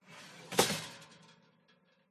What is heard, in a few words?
glitched tones household kitchen cooker grill insert clatter